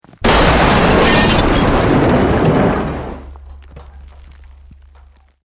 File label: Building Collapse Medium